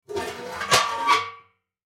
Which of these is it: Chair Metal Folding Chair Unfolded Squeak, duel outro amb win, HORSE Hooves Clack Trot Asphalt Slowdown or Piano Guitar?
Chair Metal Folding Chair Unfolded Squeak